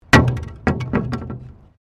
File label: Wood On Wood